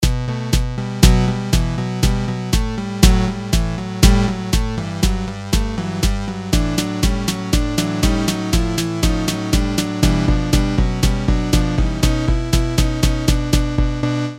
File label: clash of ghouls